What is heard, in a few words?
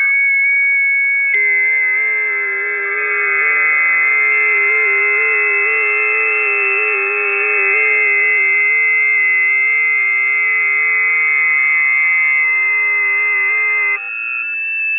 20m busy, ft8; Karlis Goba